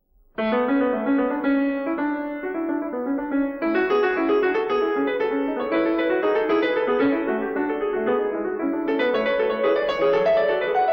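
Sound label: A major Fugue, piano; BACH; WTC; BOOK II; Feinberg, Samuil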